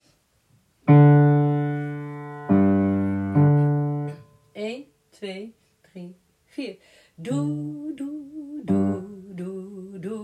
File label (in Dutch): Derde stem